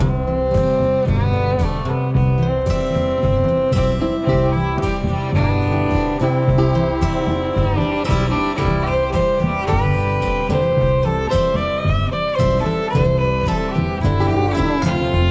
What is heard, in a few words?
Cutscene; Country Fried Cabin; Cooking Dash